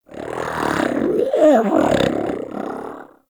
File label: Combat Vocal Idle; Warframe; Catbrow